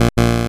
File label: boo